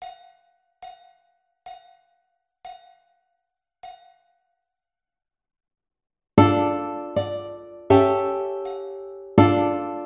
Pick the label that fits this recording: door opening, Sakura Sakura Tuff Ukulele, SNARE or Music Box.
Sakura Sakura Tuff Ukulele